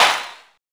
STADIUM PRC; TEK PERC2

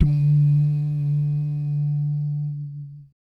BS DUMM, Vocal Planet Jazz & FX; ILIO; BASS DUMMS

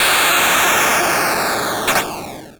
Drums, Hits; DCM8

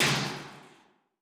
Hvy Metal, Alesis D4

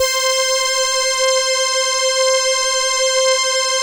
ELKA, String Machines; ELKA RHAP

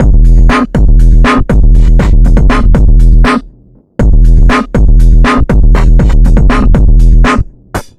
swing hip 120bpm, Downbeat Dance Grooves

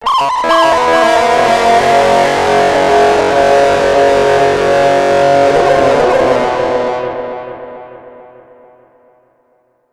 FX DIST; FX DISTO

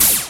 RAZOR SLICE; E-MU Producer Series Vol. 2; More Studio Essentials; Composer; Technopourri

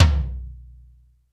T, 60S KITS; 90 sSampleCDs; Crypton - DrumBase5000

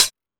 Techno G, Drum Machines, EMU XL-7

